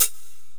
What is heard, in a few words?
HH, HIHAT HIP, Dance Mega Drums; Best Service